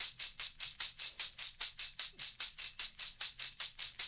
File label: LOOP, SHAKERS; InVision Interactive; Percussion Slam